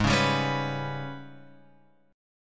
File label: G sharp Minor Major 7th Double Flat 5th; G#mM7bb5 Chord {4 4 5 6 X 7} strummed